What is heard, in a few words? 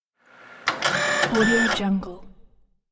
printer; moonaudioproduction